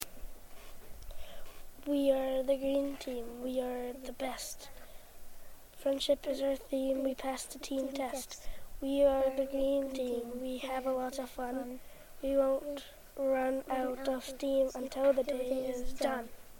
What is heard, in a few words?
green team chant